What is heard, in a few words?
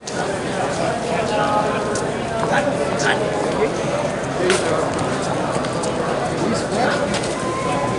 MED CROWD; 1000fx